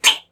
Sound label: gun; Fight Knight